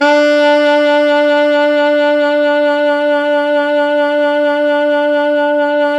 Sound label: Pop Brass, D3 -R; Best Service ProSamples vol.19; AKAI